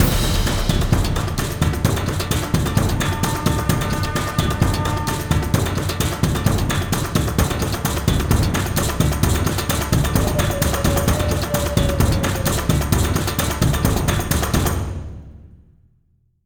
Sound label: Combat; Skellige; Ice Giand Island Combat Layer 01